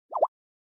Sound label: Sent chat; Samsung; Galaxy Chat